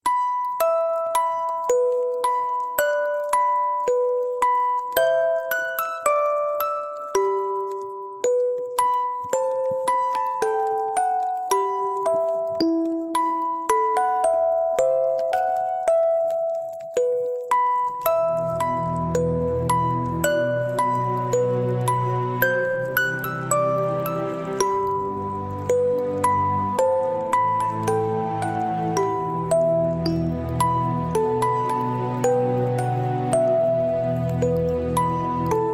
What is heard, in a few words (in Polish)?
smutne dzwieki utwor instrumentalny